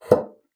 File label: BASSOON; Xsample VOL-6